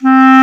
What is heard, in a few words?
clarinet C 4; East West; Global Sounds